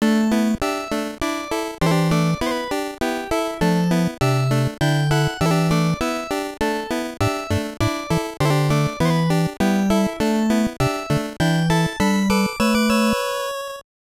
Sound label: Demo Music; Famicom Mukashi Banashi: Yuuyuuki; FDS